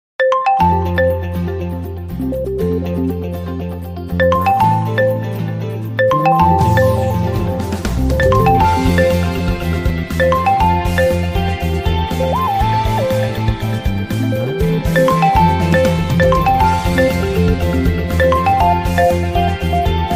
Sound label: Official Ringtone; Vivo Y21